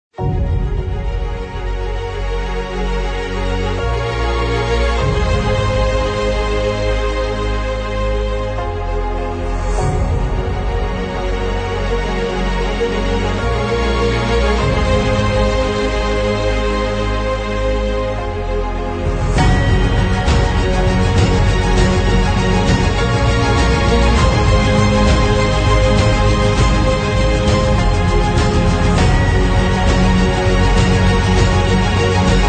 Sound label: Inspiring Uplifting Epic Motivational Cinematic Trailer